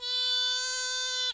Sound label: fox; Animals